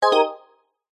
multimedia game sound positive warm bright bell mallet tone short